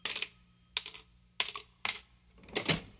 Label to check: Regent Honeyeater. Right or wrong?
Wrong